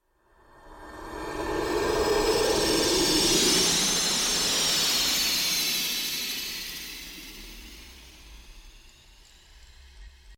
snare mist

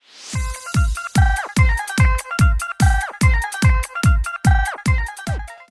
entrance

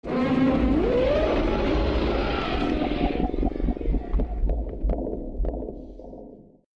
female roar; muto

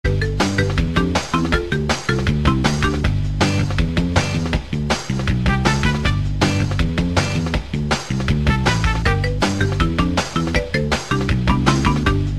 main menu ost; crash team racing

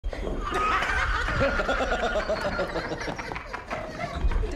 laughing; downfall

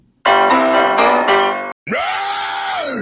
goofy piano riff raaaaa

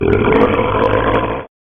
tiger growling; soundbible

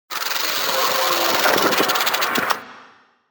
robot rattler

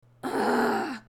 female grunting

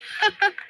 pandas cute hehe

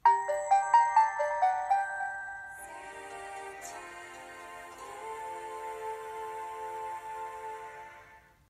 ringtone; zte

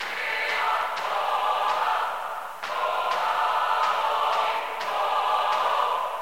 ringtone; dragonbrain; gta iv

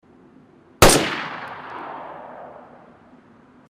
single gun; dayz

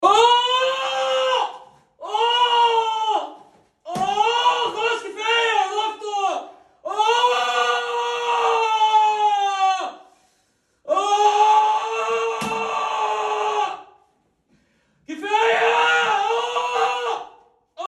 ahhhhhhhhhhheheheheh

